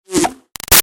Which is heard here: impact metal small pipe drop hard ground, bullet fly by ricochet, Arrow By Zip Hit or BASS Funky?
Arrow By Zip Hit